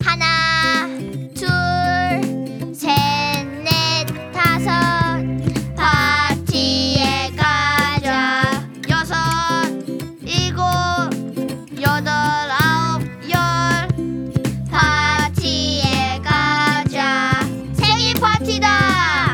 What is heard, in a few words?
song korean